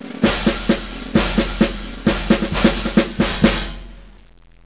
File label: Military Drum Cadence